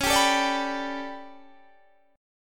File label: A 11th Db, guitar chord